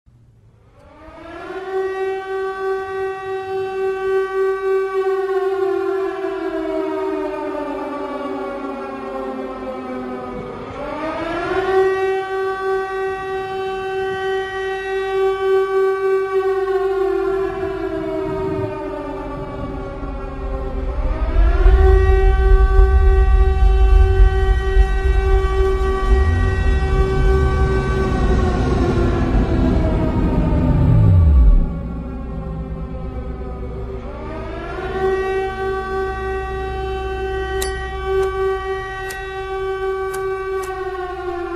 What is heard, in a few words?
siren sound; Silent Hill